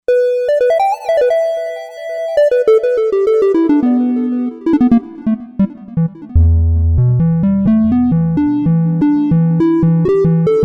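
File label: Tringle, synth lead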